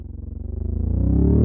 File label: Charge, physcannon; weapons; Sourceforts2.0